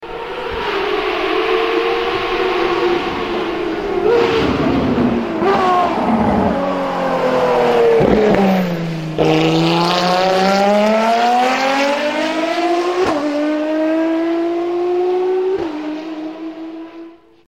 Ferrari FXX K

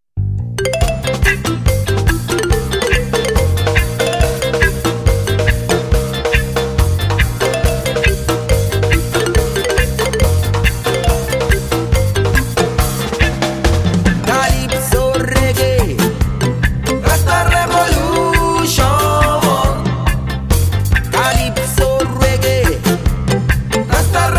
calypso reggae rasta

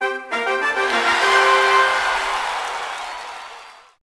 arena start

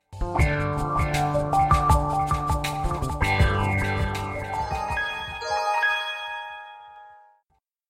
high quality sms; ringtones

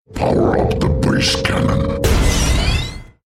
power up bass cannon

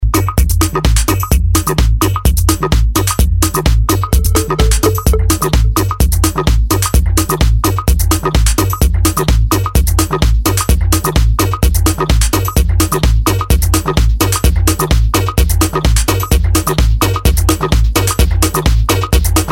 Tribal Beats

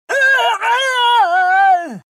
Death

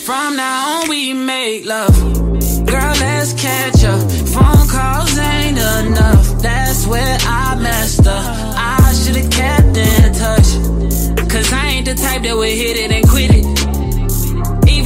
Ragtime

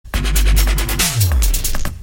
dubstep wobble

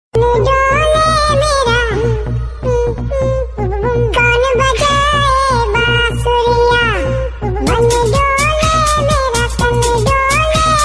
hindi funny song

